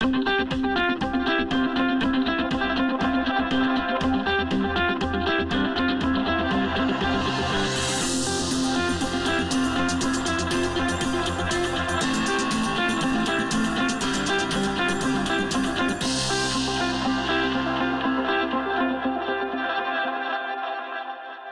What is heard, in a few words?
Media volume; Lucid 4G VS840; ui; LG